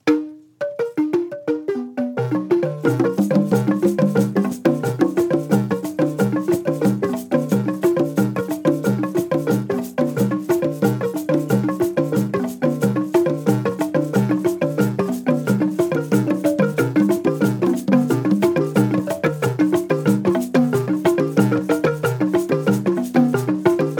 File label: zambia